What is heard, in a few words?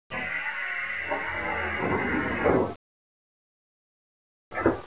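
Door Close, Train; Z5300; OpenBVE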